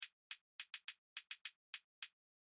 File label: HiHat; Remix Sample Pack